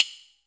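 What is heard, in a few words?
GATE percussion; Crypton - DrumBase5000; GATE KITS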